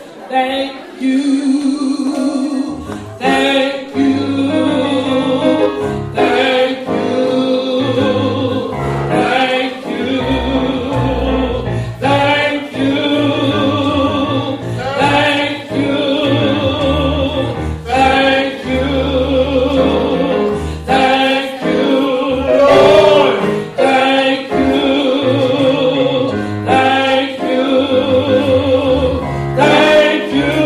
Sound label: tenor